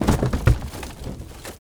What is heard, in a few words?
Cardboard Boxes Destroy; Ambience; Props; Warframe; Nineteen Ninety Nine